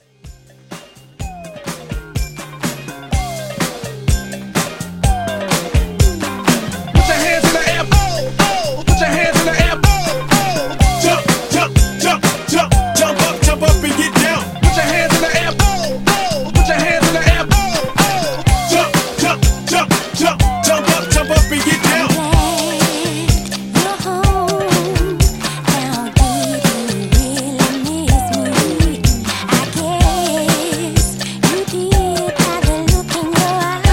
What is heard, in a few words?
70s Hype Re Drum 125